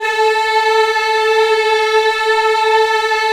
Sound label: A3 WOM EH, Choir; WOMEN; USB Soundscan vol.28; Acoustic & Synth; AKAI